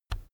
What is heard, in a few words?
keyboard external key push soft